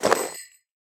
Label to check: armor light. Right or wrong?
Right